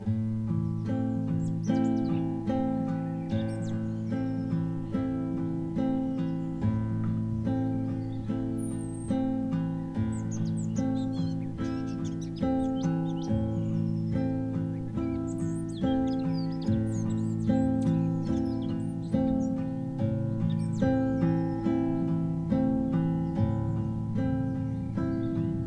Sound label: birdsong backing track, ukulele